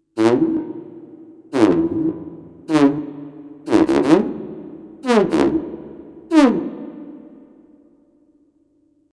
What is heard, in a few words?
Sax tuba orchestra